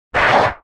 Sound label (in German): schaden; Barotraumatic; Razzershrimp